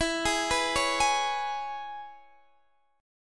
E 6th, Guitar, DADGAD

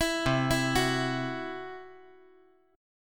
C-Major Flat 5th

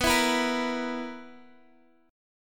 B Major 7th Suspended 4th, Guitar DADGAD chord

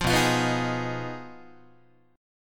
B 6th Flat 5th, down, Guitar; strummed

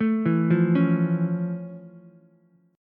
F Augmented Major 7th F Ukulele; F+M7; arpeggiated

